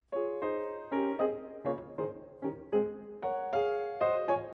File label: Bethoven Moon Light staccato, piano; ベートーベンピアノソナタ作品２７（月光）第２楽章; スタッカートの例：音に「・」の表記。サラッと軽やかに